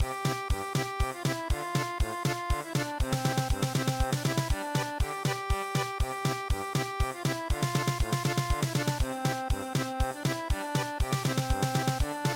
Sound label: German Polka, traditional